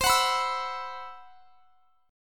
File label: C Major 7th Flat 5th C; CM7b5 strummed